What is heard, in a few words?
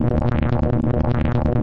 dark spaceship engine room loop; LOOPING AMBIENCE